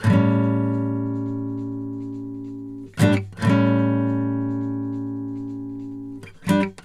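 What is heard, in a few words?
Country Guitar Breakdown; Digidesign; Pro Tools Essential Loops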